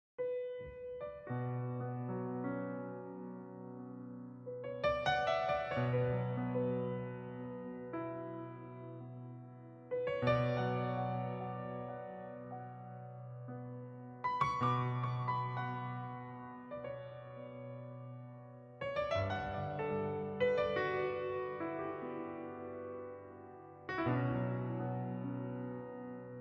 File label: Chilled Jazz